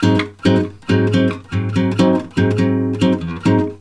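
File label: G# 7 guitar, Brazil Bossa; USB Soundscan; AKAI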